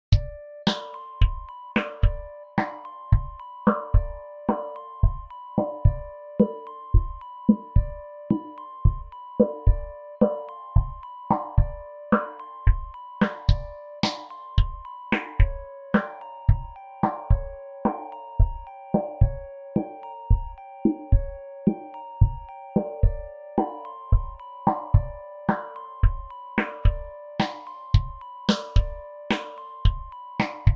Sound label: keys